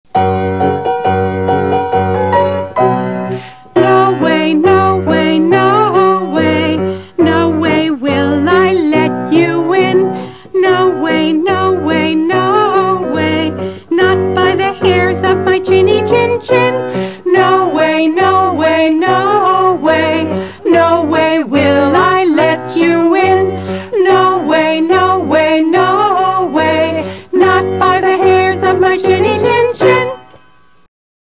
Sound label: Pigs Reply - No Way Song - Vocal